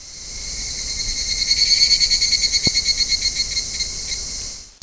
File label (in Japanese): higurasi; zukan